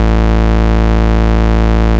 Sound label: Bass A0; Ableton Foundation FreePacks; Foundation Glitch Toys & LoFi